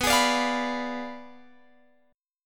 B Diminished 7th, down, Guitar, Open F; strummed

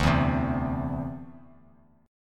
D 7th Suspended 2nd, D7sus2 chord; D7sus2 strummed

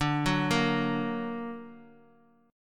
D Augmented D down; D+ arpeggiated; JGuitar

